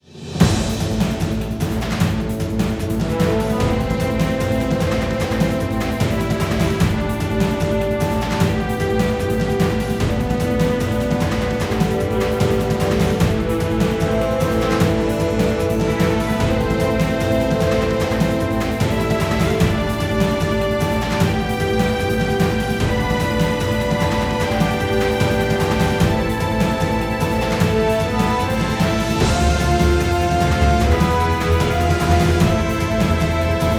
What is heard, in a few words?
Drama Urgent; Chain Chronicle V; PS Vita